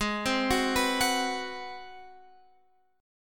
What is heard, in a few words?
G sharp 7th Sharp 9th, Guitar, Open F; arpeggiated; x,15,12,13,14,13; G#7#9